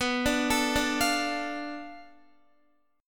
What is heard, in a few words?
B Minor 7th Flat 5th, Guitar; Bm7b5 arpeggiated